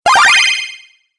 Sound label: Classic Delay Game Hit, video game sound effects